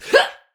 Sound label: Attack Effort; Warframe; Tau; Dax; Ninja